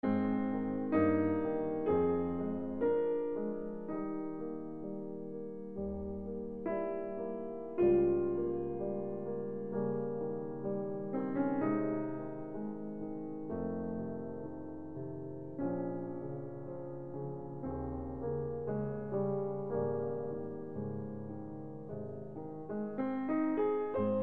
Piano Sonata in C Minor, Pathetique II. Adagio Cantabile